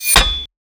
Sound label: metal hit; 3maze - FINAL IMPACT